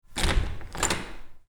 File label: Door open; iMovie '08 Sound Effects